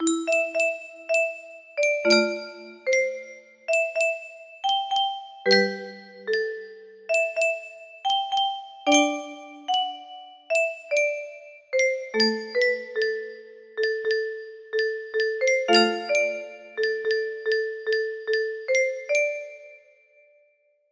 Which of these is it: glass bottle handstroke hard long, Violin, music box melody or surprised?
music box melody